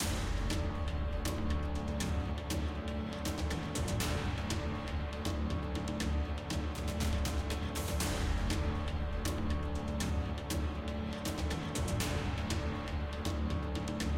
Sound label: Field Tension Urgent; Tower of God: New World; Android